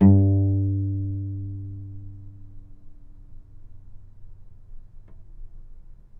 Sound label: G2 - Pluck, novice cello